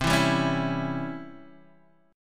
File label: C sharp Minor Major 7th Flat 5th C, guitar chord